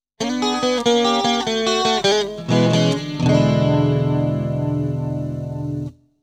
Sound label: blues end